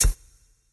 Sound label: reels stop; Wazdan